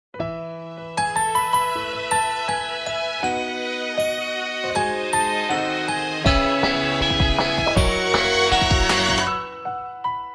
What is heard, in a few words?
inst